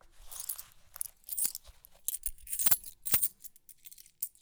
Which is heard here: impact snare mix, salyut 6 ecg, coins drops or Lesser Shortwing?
coins drops